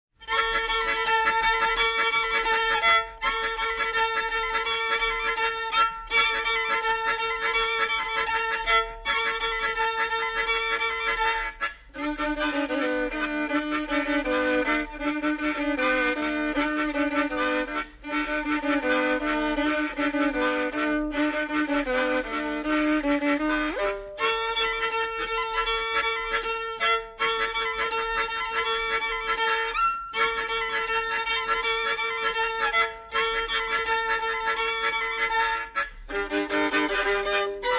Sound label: ecstacy of strings